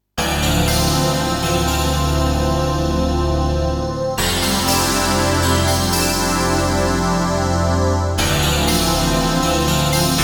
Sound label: Staccato Yamaha TX7; StaccatoHeaven